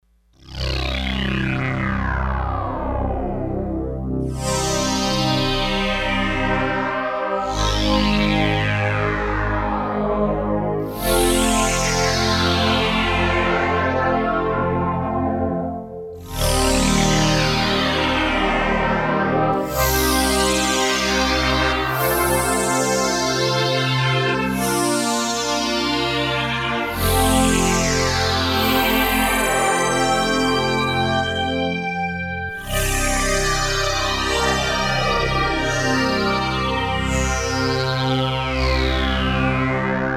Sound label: Resonance Sweep, Roland D-10; Factory Patches